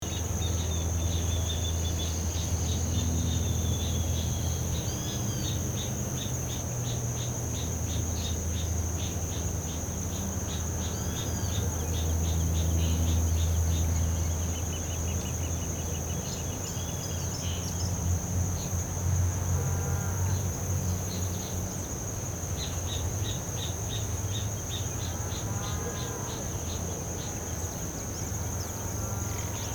Morning farm ambience birds singing

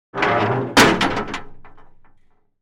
Metal prison cell door slide close and latch

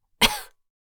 Adult female single cough

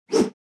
Text whoosh

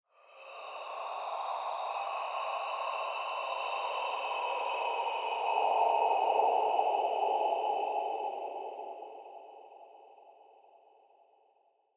Ghostly scary presence suspense eerie; horror

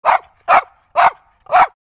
Small dog growly barking barks

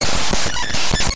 Heavy white noise glitch

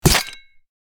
Spear impact medieval armor hit

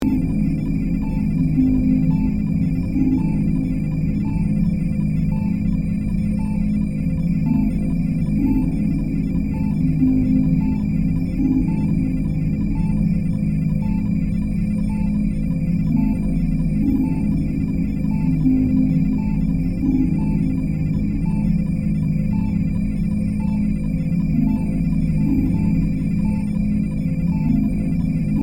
Space station hospital atmosphere

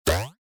Cartoon designed boing

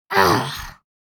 Young girl grunts

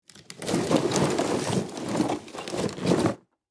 Wood pile movement

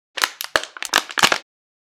Plastic bottle squeezing and crunching; gfxsounds

